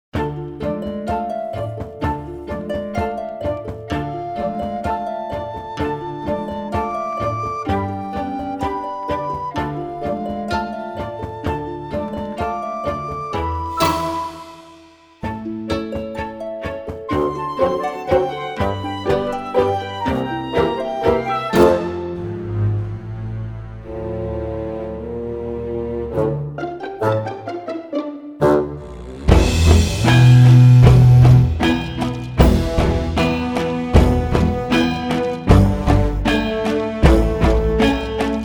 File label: backing track